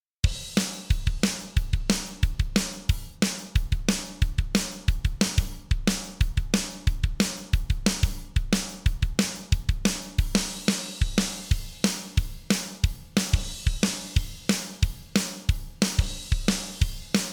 drums comp off

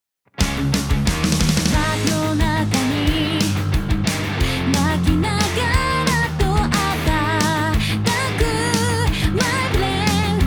Double On

